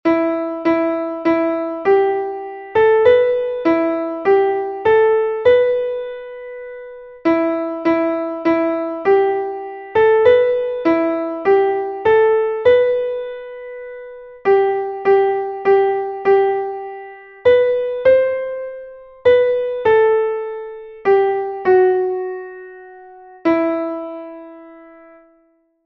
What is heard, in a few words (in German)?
klavier; MelodieMeister